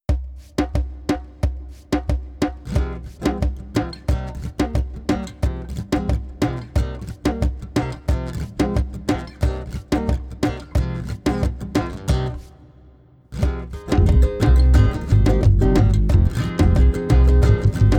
flamenco vibes 432 hz

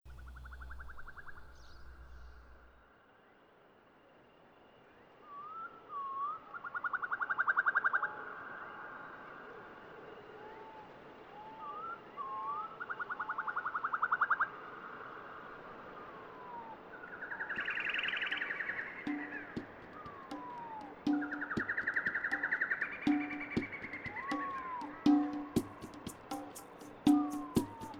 flute 432 hz

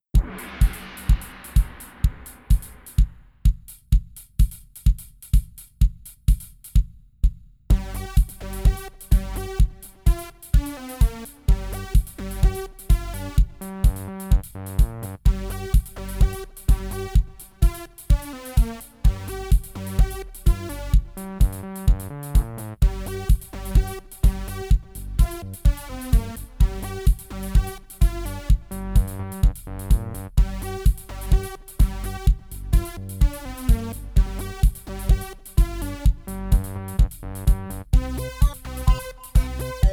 fitness music 432 Hz; 127 BPM

